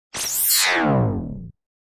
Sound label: Stab HF Elect Buzz